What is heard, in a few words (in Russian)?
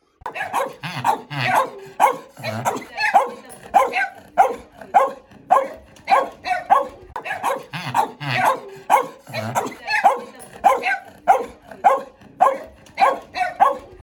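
cobaka laet, dog barking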